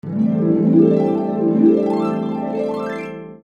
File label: harp